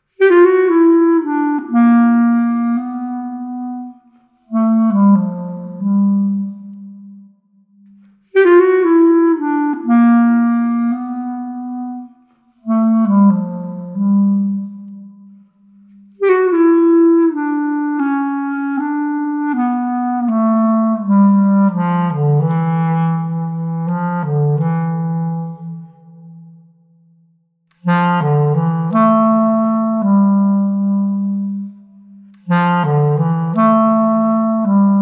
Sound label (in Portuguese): Tema Clarinete